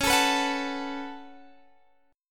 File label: Db 7th Suspended 4th Sharp 5th Db down Guitar Standard; strummed; chord shape; JGuitar